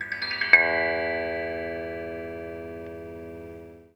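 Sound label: HI ARPEGG, MISC guitar; USB Soundscan vol.31; Miro s Grooves; AKAI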